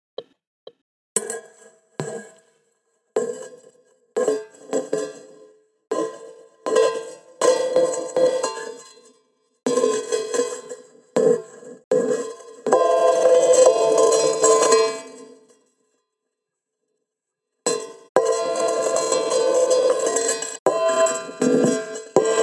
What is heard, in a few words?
Backing Alone